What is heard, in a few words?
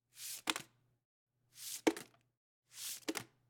Sliding Envelope Into Drawer